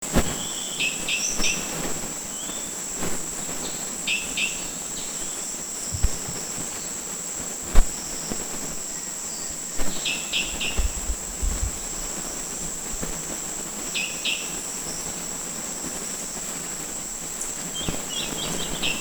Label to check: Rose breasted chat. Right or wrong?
Right